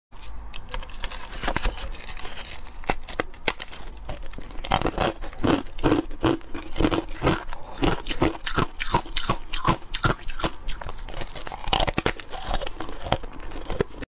Eating dry ice; چالش خوردن یخ خشک

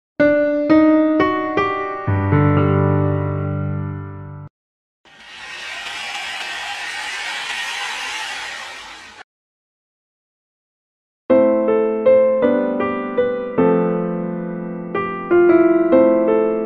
Piano; پیانو نوازی میم مثل مادر

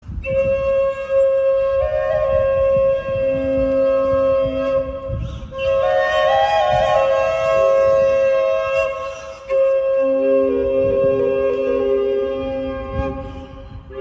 Shakh Nafir, ساز شاخ نفیر

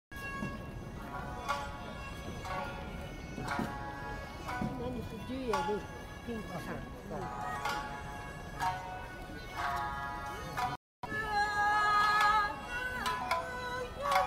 kokyū

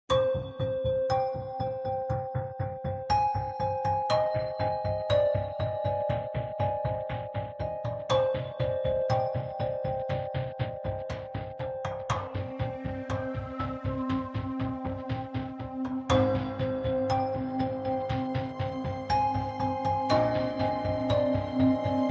mysterious music; موسیقی معمایی بی کلام

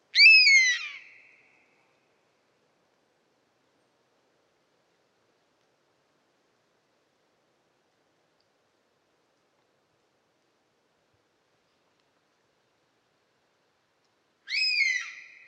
European Honey Buzzard; سارگپه جنگلی

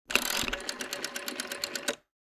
rotary phone

